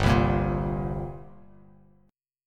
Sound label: G Minor Double Flat 5th, G, Bass Standard; strummed; JGuitar